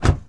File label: Mustang Door Close; SFX Kit; Automobiles